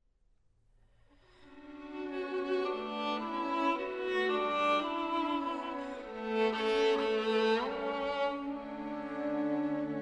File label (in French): Quatuor a cordes; Choveaux